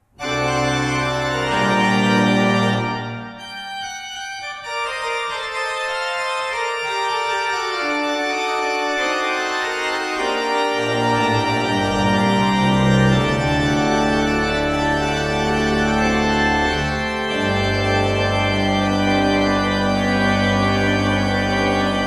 Fleiter 19 II P, orgel

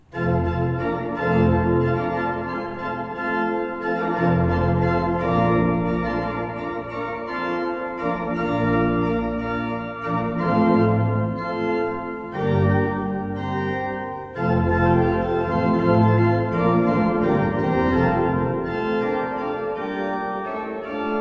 Beckerath, gebrauchtorgel

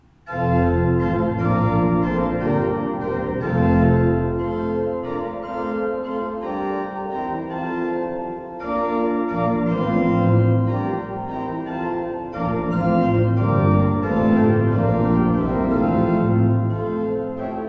Beckerath, gebrauchtorgel

